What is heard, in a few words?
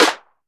snare; deepsampler